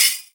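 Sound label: space shake; Combo 1; E-MU Formula 4000 Series Vol. 2 – Techno Trance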